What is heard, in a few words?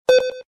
time over